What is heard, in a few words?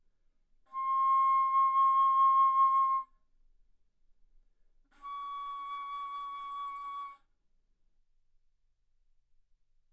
Bass Flute ff C6 Db6